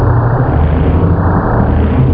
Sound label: motor; Duke Screw'em 38D